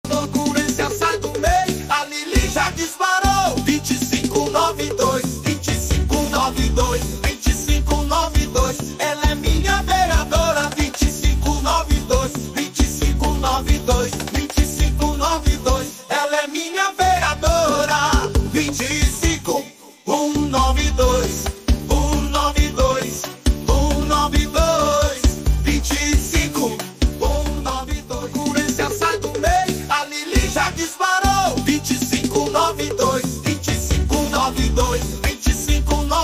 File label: SWING